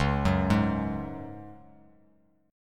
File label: Db Suspended 4th Db; arpeggiated